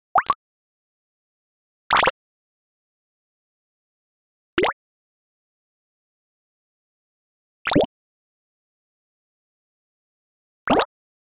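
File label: button press sound effect water material UI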